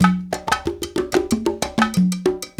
World Dance; Spectrasonics - Supreme Beats

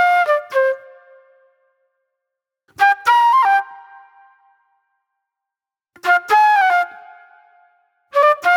Raw Funk Flute; Sonic Foundry (Sony Creative Software); Manhattan Flutes

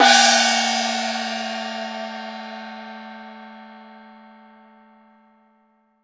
DARUO OPN, PERCS-KIT; INIS - Opium

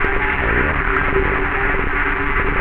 FX, Futurist Drum & Bass

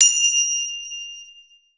China, ChinesePrc; Akai MPC500

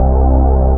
BAS, Eurotech; Sounds Good

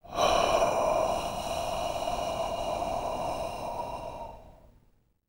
BRTH, BREATH FX, Jazz FX

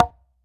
H CONGA SL, CONGA

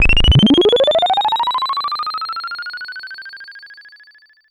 Pollard Syndrum

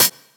Hat, Hi Hats; Zero-G Sound Sense Grime Scene; GS Kits

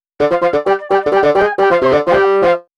Horns; Cinematic Funk; Sonic Foundry (Sony Creative Software); Cinematic Funk Urban Tone Poems

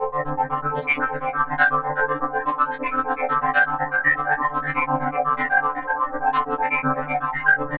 Analog Synth Pattern; Sonic Foundry (Sony Creative Software); Electronic Point Blank

